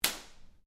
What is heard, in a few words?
Whip Crack Belt Strap; Foley sounds for melee weapons; Objects